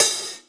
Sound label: half open, Drums Percussion; Zero-G; Total Drum Bass